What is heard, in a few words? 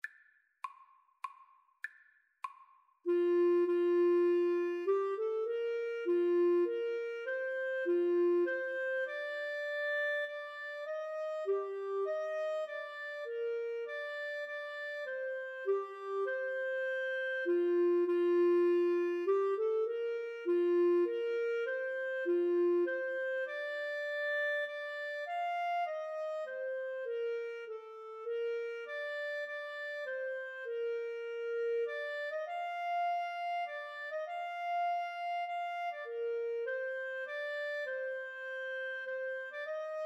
clarinet saxophone duet